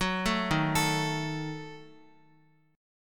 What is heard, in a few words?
Eb Diminished Eb down Guitar, Ebdim chord; Ebdim arpeggiated; JGuitar